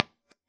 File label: 4x12 V30 U87 2.5in; IRs